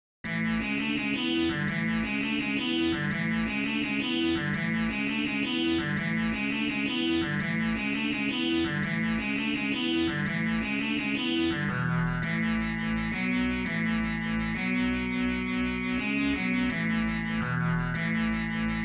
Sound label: synth; robot 64 lore